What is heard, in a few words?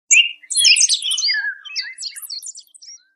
ring, birds; HTC S740